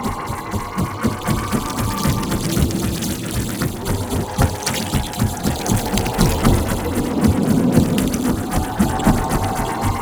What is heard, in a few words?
Hover Loop; Enemies; Abilities; Warframe; Amalgam Override